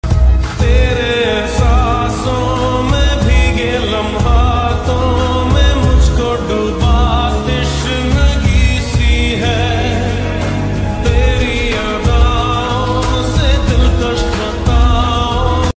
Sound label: love hindi song ringtone